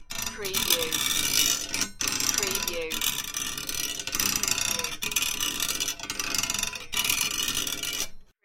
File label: Small Metal Chain Rubbing